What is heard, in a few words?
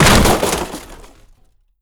break plastic barrel; physics; breaking